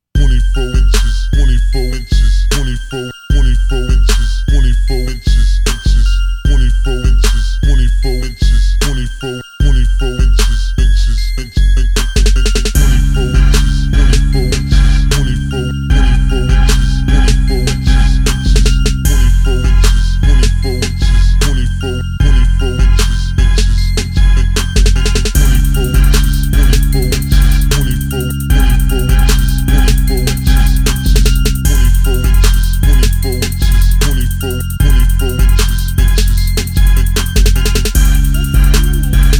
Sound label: symph crunk